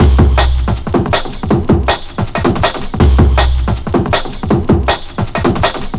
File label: 160, drum loops, drum & bass; Total Drum&Bass